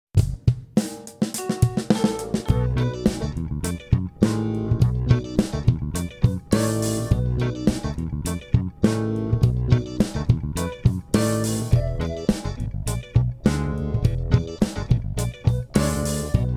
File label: Funky Too